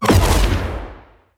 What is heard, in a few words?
glowing hourglass activate